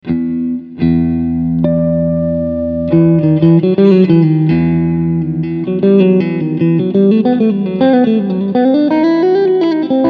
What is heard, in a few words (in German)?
Hals Mitte S-1 OFF